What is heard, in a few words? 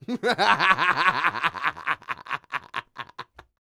LAUGH; Spectrasonics Vocal Planet; Jazz FX